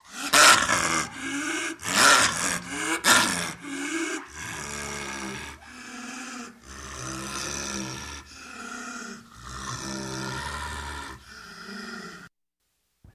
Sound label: Baby Dinosaur Roars B